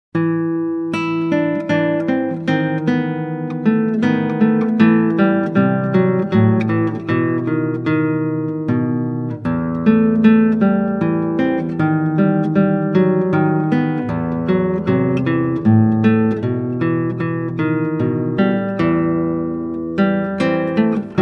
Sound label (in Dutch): Klassieke Gitaar; Bach 50 Solos